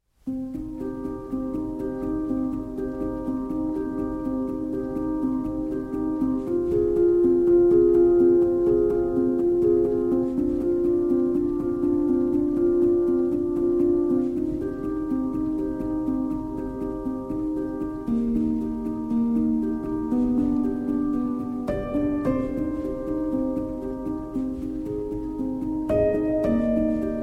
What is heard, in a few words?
Lullaby